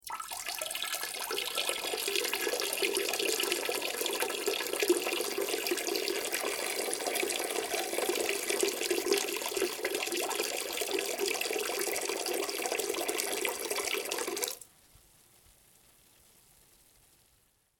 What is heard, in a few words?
Water Pee Urinate, Water, Pee, Urinate, Water, Pour; Sound Effects:Nature; BlastWave